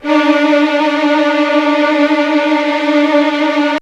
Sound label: HF TRLL D4, Violin; Q Up Arts; Denny Jaeger Master Violin Library; PERFORMANCE